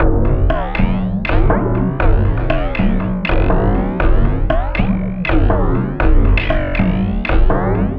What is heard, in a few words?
Frame Drum Loop, Ethnic Instruments